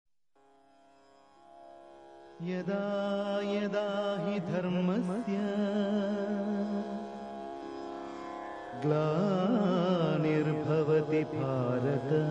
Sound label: Om Krishnaya Namaha Chant; Bhakti Sangeet